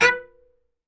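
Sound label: B3 SPICCATO, CELLO; Northstar; New Gold VOL-2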